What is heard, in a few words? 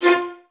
Violins F 4, String Ensembles; Miroslav Vitous; LINKS ORCH